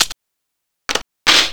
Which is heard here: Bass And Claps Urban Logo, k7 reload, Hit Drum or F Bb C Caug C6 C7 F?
k7 reload